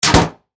Trash Bin Lid Close, Industry, Trash, Bin, Lid, Close, Metal, Large; Garbage; BlastWave